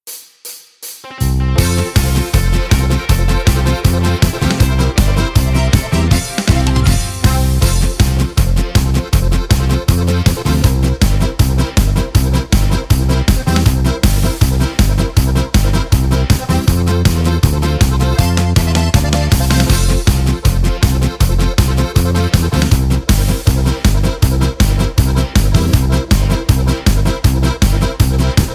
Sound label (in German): G C F B ohne CHOR